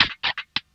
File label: LOOP L, Drum & Bass 160 BPM